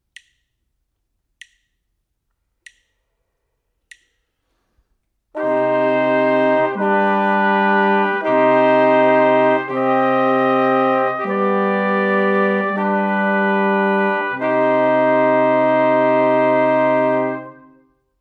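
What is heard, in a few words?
harmonic dictation, brass quartet; ear training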